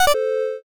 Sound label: eliminate